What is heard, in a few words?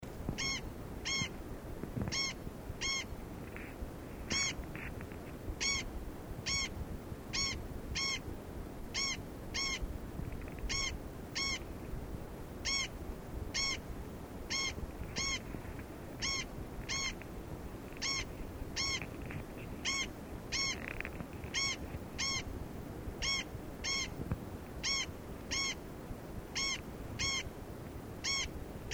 crex female, crex crex